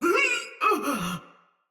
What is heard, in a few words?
Death Soldier Mace Agent Female; Battle; Sinecure Melee